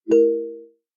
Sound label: unsure, notification sounds